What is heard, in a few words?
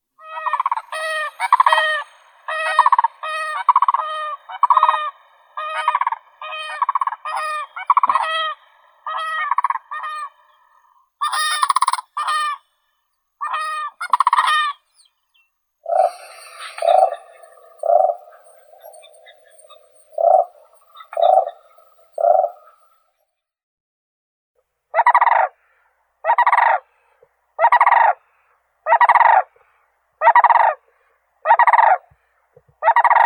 zhuravl kanadskii grus canadensis; zvuki prirodi